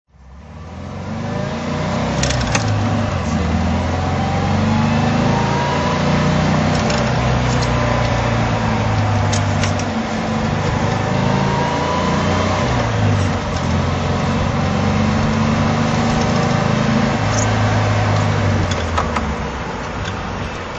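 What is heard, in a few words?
356 speedster drive, Porsche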